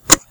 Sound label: unlocked; intricacy